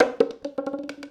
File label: Roll Plastic Soda Cup; SFX Kit; Foley - Misc